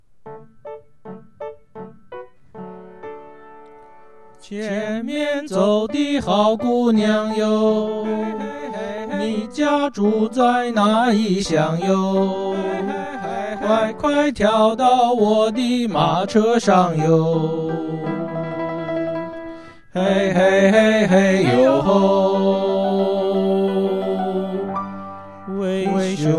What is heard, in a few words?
bass